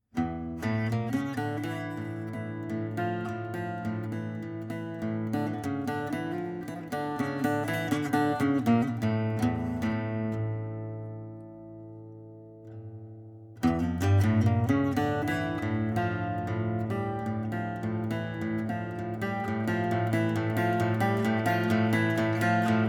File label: Baritone Cittern